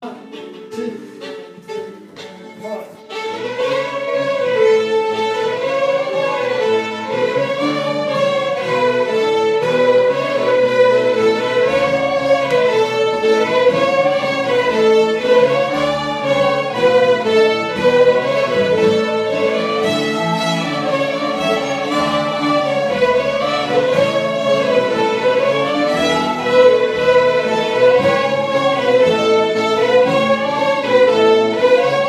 Welsh; The white Cockerel

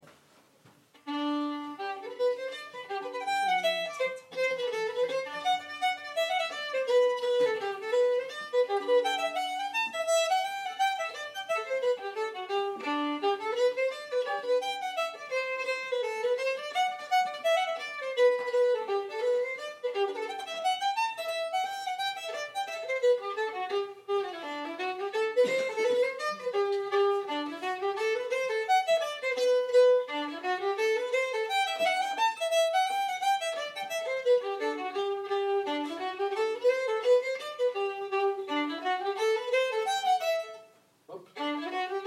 Hornpipe

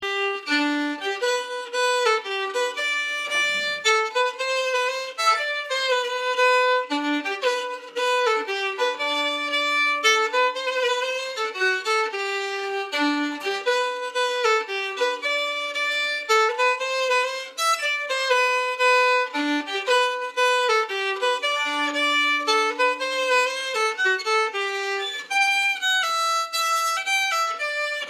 Mazurka

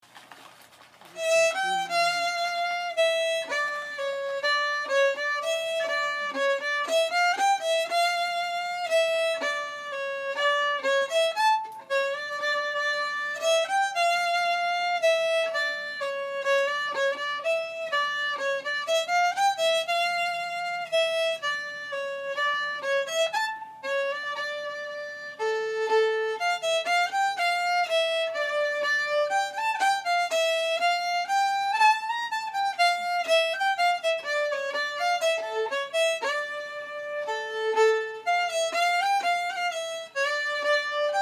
Waltz; Waltz from Transstrand

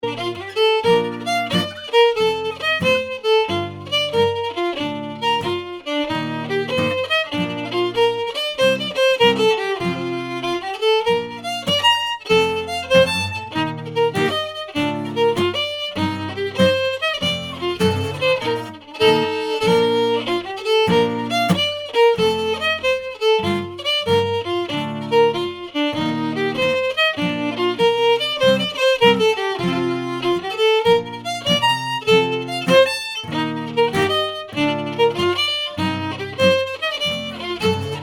Melody and Chords; Beeswing

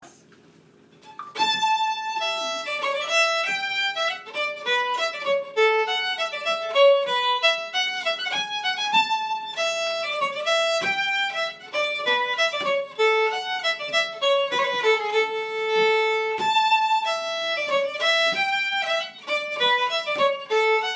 Polska

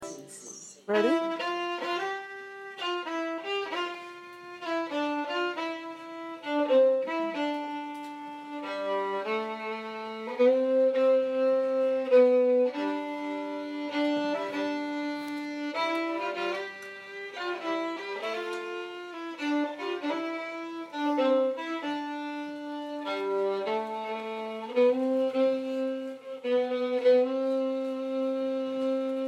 Waltz

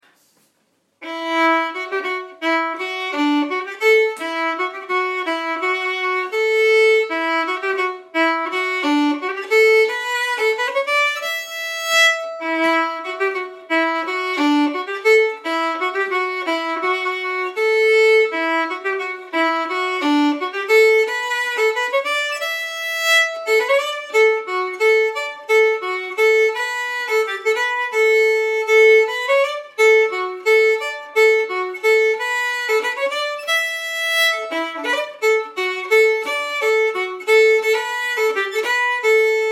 polka

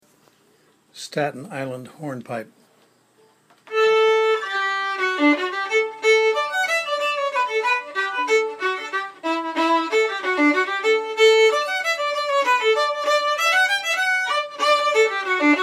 Hornpipe

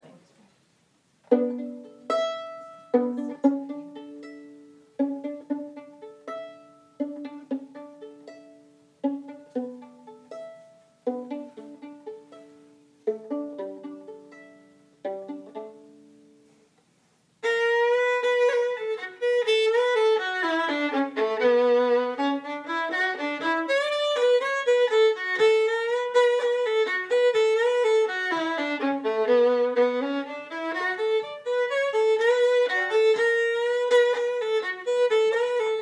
Foxs Wedding melody

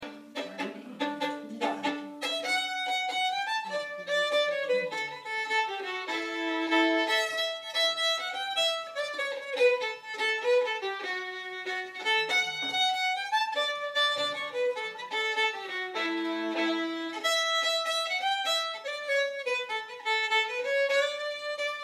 Canadian Jig; Aunt Mays

